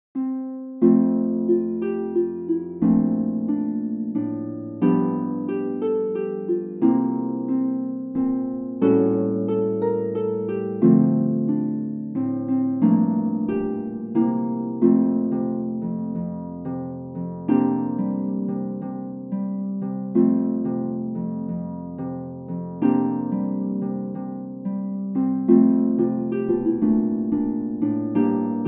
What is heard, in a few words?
We Wish You A Merry Calypso midi